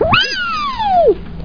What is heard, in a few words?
Weee; How Many Bugs in a Box